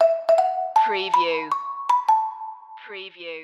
learning for life stinger

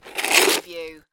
Snow and Ice, Ice scrape slide

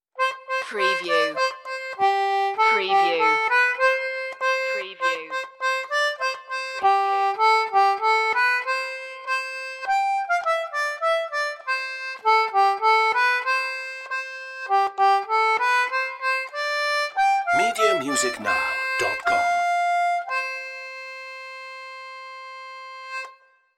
good king solo concertina

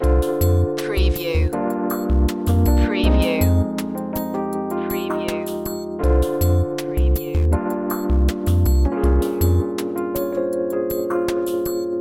town beat loop

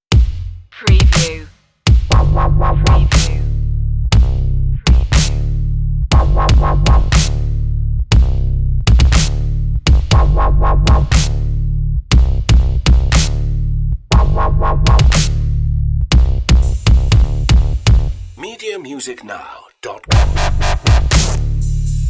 break beater no risers effects

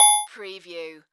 Multimedia Bell

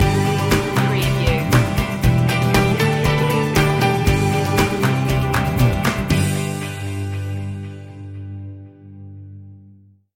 just smile stinger

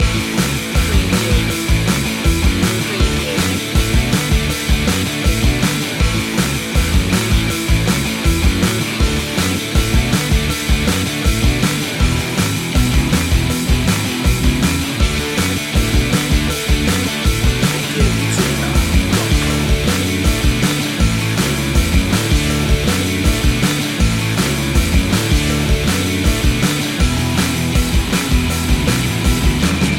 maximum rock n roll loop

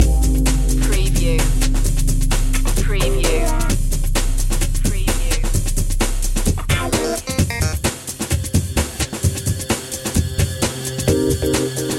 funky loop

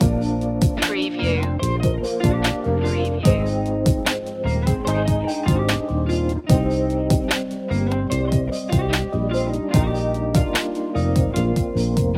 sand loop

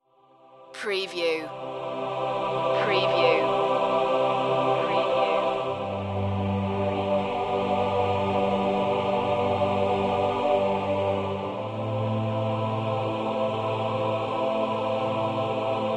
blissful choir

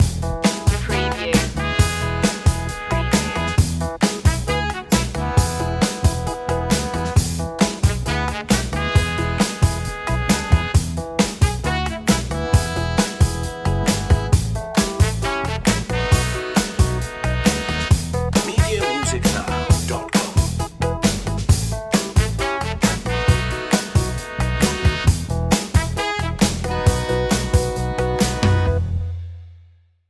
easy groove

